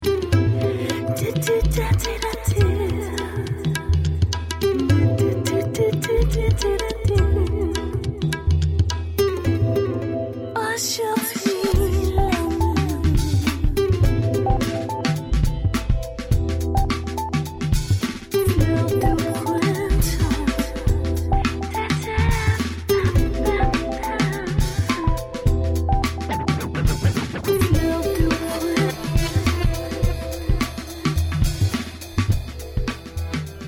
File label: Erotic Rhythms